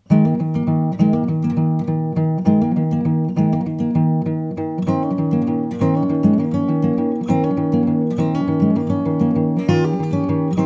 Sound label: cello song; fragment